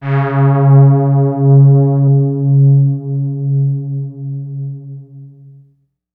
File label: diggin, excessive stereo; E-MU Producer Series Vol. 6 – Analogia Project; Strictly Synthe